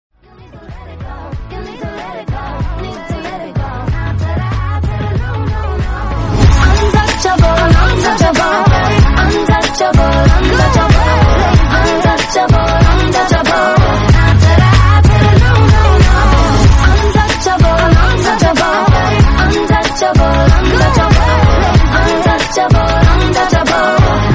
rap English song tone ringtone; english ringtones

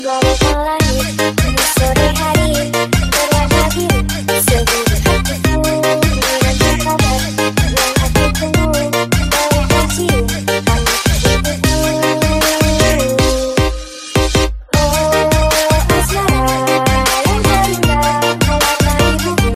english mix ringtone

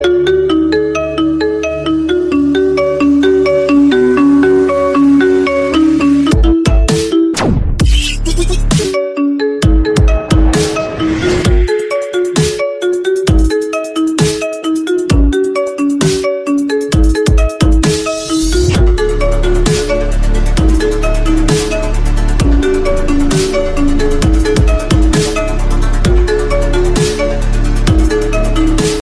xiaomi poco f4 gt ringtone

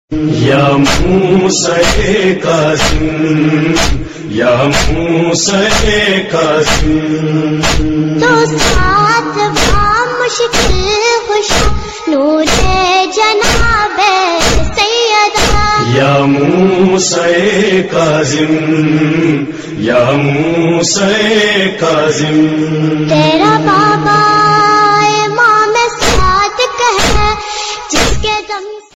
noha ringtone

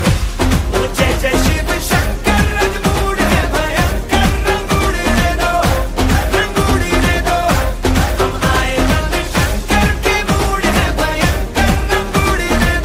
mobile ringtone

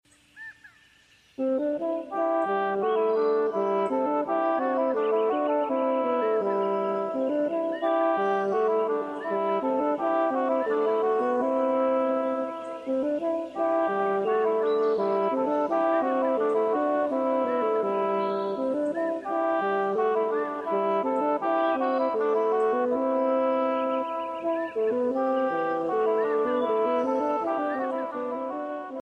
sound of nature background music tone ringtone

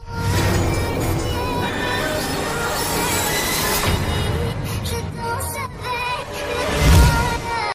sms english ringtone

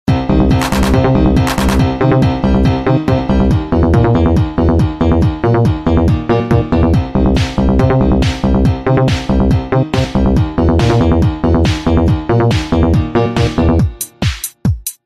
trance ringtone

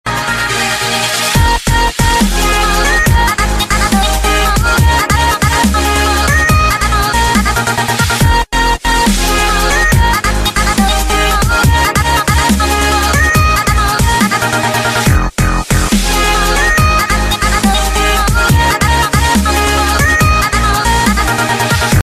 nokia lumia ringtone; nokia lumia 204

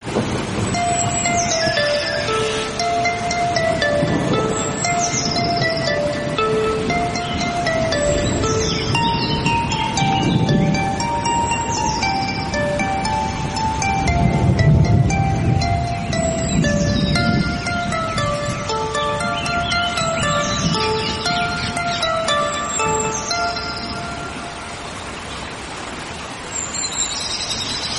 nature relaxing rain sound musical

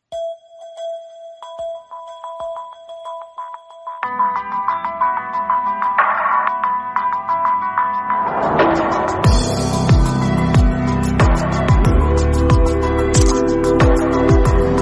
ringtone; vivo v17

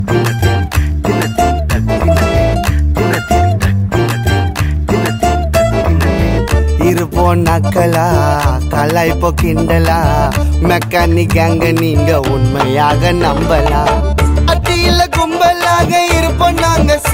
tamil music ringtone

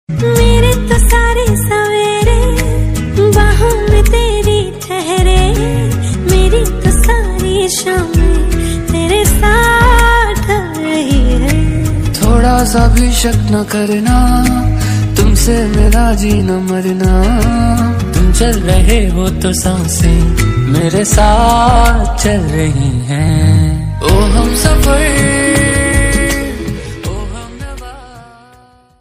love song ringtone

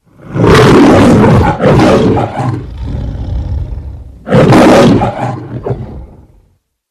lion ringtone; mgm

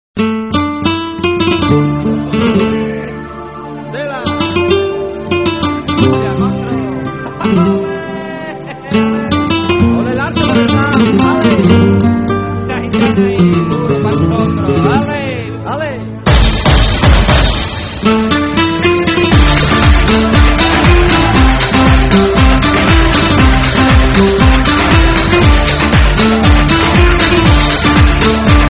spanish guitar ringtone

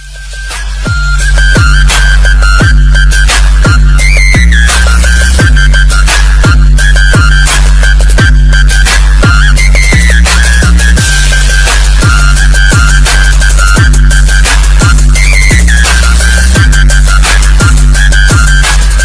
english ringtone

